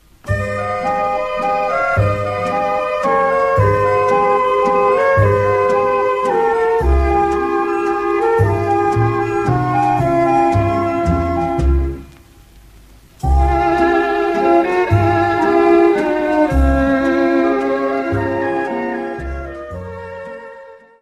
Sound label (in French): Valse Croate, Croatie